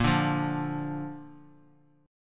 A sharp Major; strummed; JGuitar

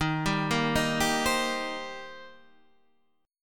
D sharp 6th; arpeggiated; D#6; 11,10,8,8,8,8; JGuitar

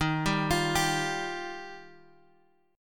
Eb Major Add 9th, Guitar, Open G

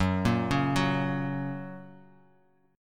F sharp Major, F sharp, chord; arpeggiated; JGuitar

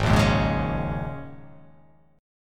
Ab Suspended 2nd Suspended 4th, Absus2sus4 chord; strummed; JGuitar

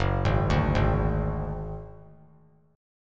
F sharp Suspended 2nd Sharp 5th; arpeggiated; JGuitar

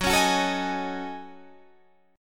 Gb Minor 6th Add 9th Gb down Guitar Standard; strummed; JGuitar

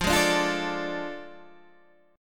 A Major 7th Suspended 4th Sharp 5th F

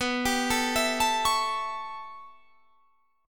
A 7th B, chord; A7/B; arpeggiated

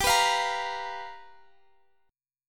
G 7th Sharp 9th G; x,x,x,x,19,18,19,19; down

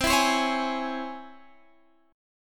C sharp Minor Major 7th, chord

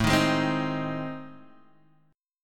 A Minor Major 7th Flat 5th

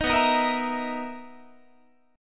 B Major 11th B down Guitar; strummed

